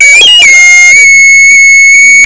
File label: high pitch squeal